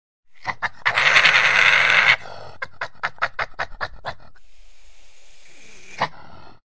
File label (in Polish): borsuk